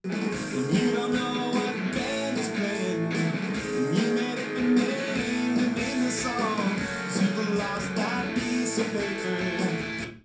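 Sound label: with drums mix; SiSEC10; underdetermined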